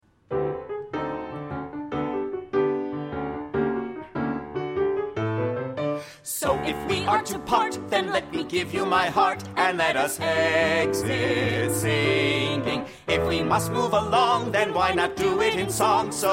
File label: Singing